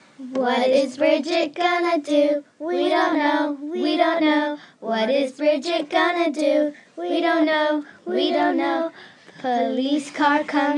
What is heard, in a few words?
girl underground song